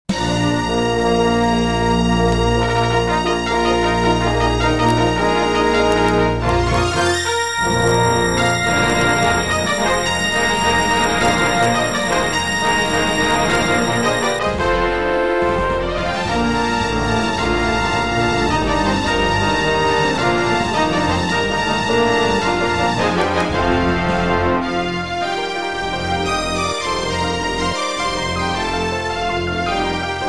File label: Intro, Gravis UltraSound; Dark Forces